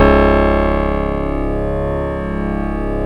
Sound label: C#, PIANO; Big Fish Audio; Synclav FM